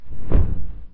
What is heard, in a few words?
Dragon Wing Flap; CastleMiner Z; Xbox 360